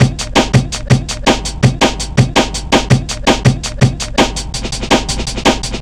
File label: 165 gabber, Drum Loops, Drum & Bass; Total Drum&Bass